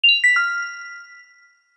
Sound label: Arctic email; Nokia N79; Audio themes